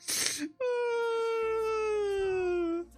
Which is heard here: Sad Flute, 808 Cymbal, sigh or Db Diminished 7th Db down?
sigh